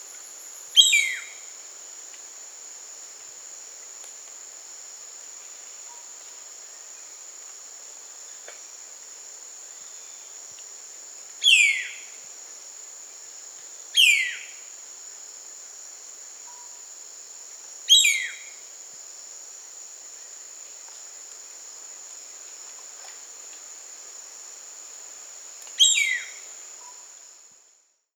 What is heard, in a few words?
Geranospiza caerulescens; roofvogels; Accipitrinae; Blauwe Sperwerkiekendief; Accipitres; Accipitidae